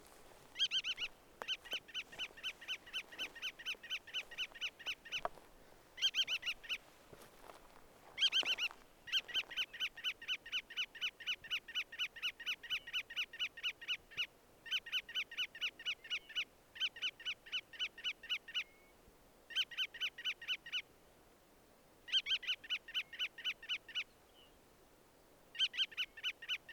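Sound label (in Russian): malyy veretennik golos, bar-tailed godwit voice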